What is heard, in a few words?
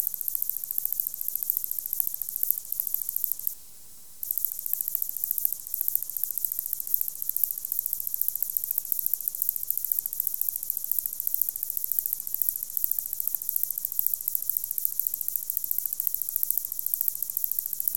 black-sided meadow katydid; Insects